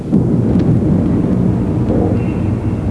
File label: THUNDR; NATUR